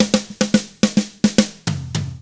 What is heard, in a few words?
POP ROCK, Double Platinum Drums; 109POP ROCK